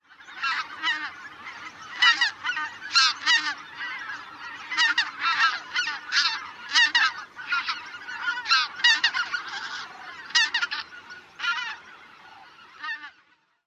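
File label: calls of flock, greater white-fronted goose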